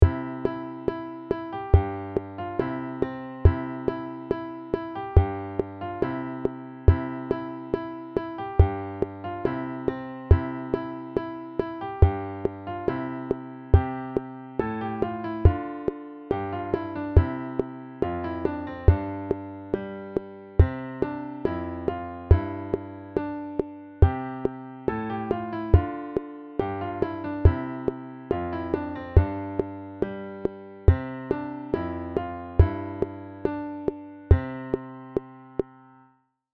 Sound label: simple bass metronome; angels from the realms of glory